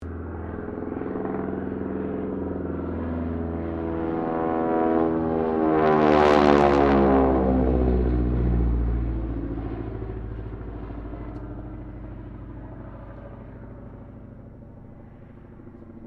Aircraft AT 6D Harvard Mk III pass bank pass PA, American military training aircraft; Airplanes